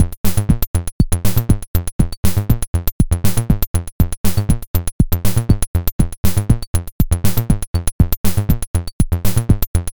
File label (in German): ALM Motto Akemie Microtuning Tonaler Beat 12 TET